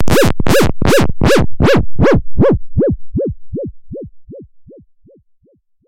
synth fx ringtone

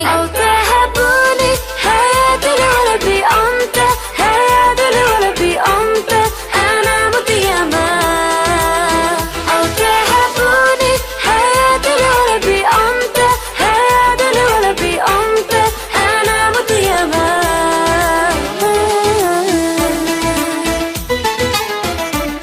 Arabic Ringtones

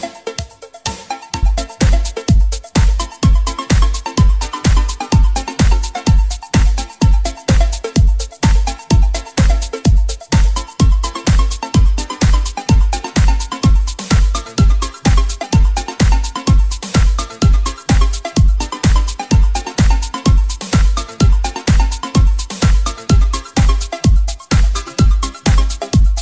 Samsung S110 Ringtone

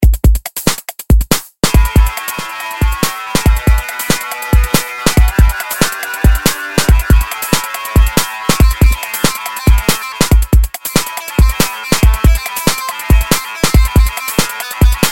super guitar RINGTONE

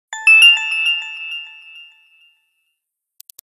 ding

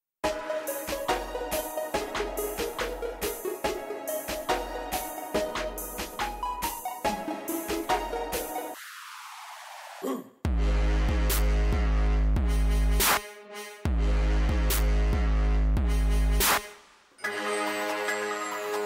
apple macbook air, ringtone

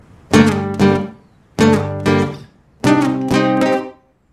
best bass guitar

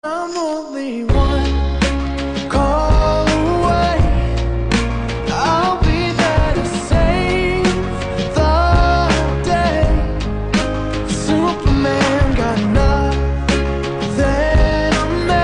ringtone

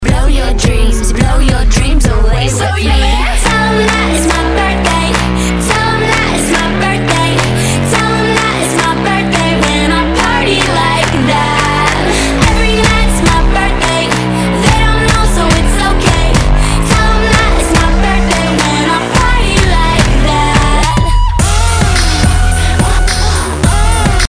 Birthday, ringtone

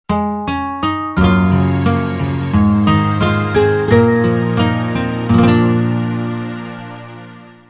SMS Ringtones

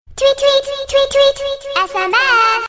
girly sms ringtone

